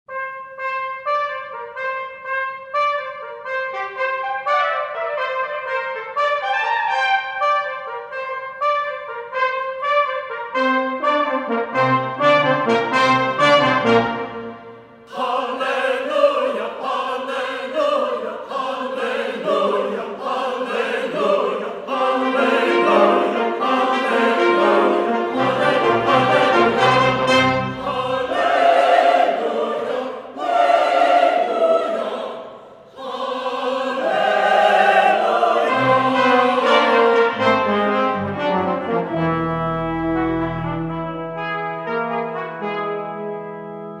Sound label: Easter Fanfare